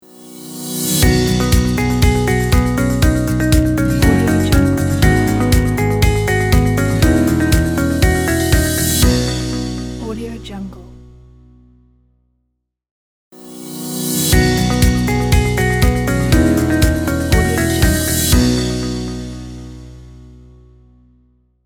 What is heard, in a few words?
the corporate logo